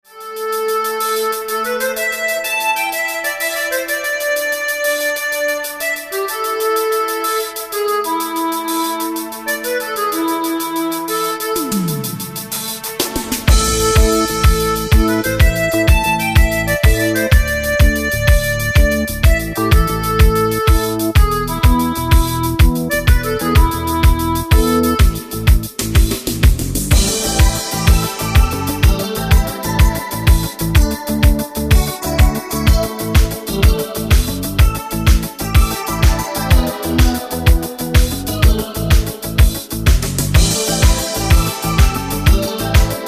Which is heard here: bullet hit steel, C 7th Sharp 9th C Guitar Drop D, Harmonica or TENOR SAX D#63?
Harmonica